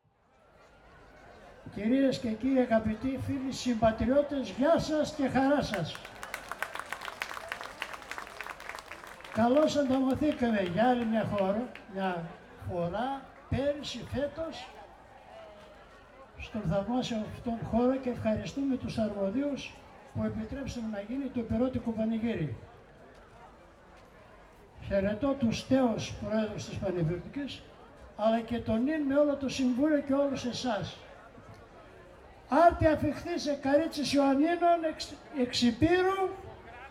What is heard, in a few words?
Athens Sunday Park Concert